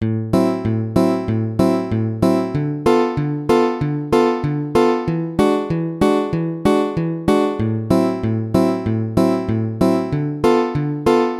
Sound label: backing